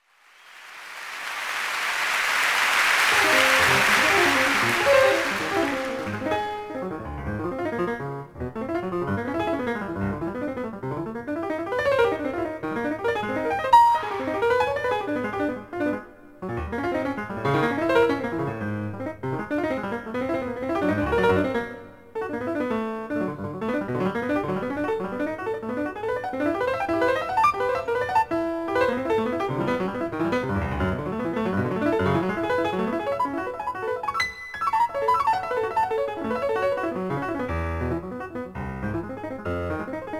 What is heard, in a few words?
sequencer